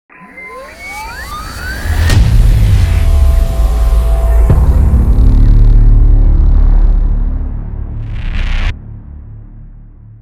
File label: robot transformation glitch logo